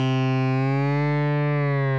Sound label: loop